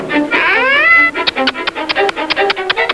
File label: birdcall; Tom en Jerry